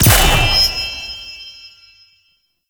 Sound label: Charge Ability Cast; Enemies; Abilities; Warframe; Duviri; Dax Knight